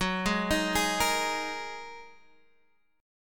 Bb 7th Suspended 4th Sharp 5th Gb

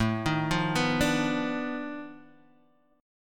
B 7th Sharp 9th Flat 5th A

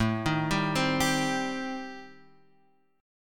A Minor 7th Flat 5th A, Guitar, Half Step Down

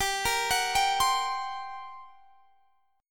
G Minor Major 11th, G; GmM11; arpeggiated; x,22,20,23,20,20

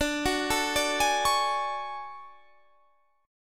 D Major Sharp 11th D Guitar Standard, chord; arpeggiated; JGuitar

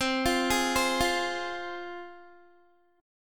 C Suspended 4th Sharp 5th, C, down, Guitar; Csus4#5 arpeggiated

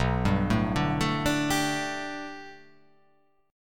B Minor 7th Sharp 5th B, chord

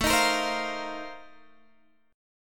Bb Major 7th Suspended 2nd Suspended 4th

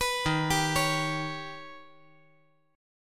Eb Augmented 7th Eb down; Eb+7; arpeggiated; JGuitar

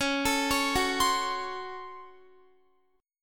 C sharp Major 7th Suspended 4th Sharp 5th, Guitar; C#M7sus4#5; arpeggiated

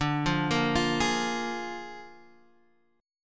Bb 7th D, chord

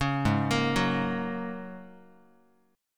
G sharp 9th Suspended 4th, Guitar; arpeggiated; chord

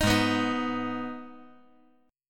Ab Minor Ab down; Abm strummed; JGuitar

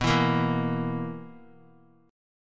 B Major 7th Suspended 4th B; BM7sus4; strummed

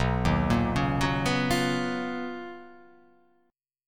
B Major Sharp 11th

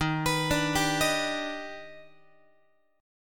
Eb Augmented Major 7th, Guitar; arpeggiated; x,11,14,12,11,14; 4A,4E,5A,5D,5Gsharp,6Csharp

